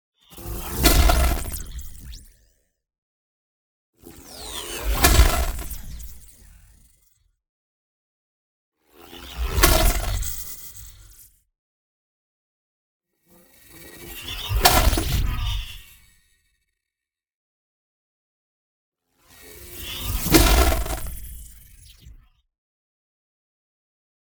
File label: NEON ZAP Sparkling Burst; boom library